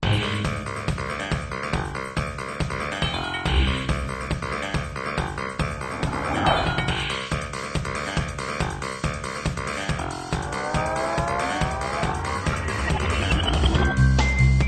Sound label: warp music